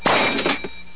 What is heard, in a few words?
eye open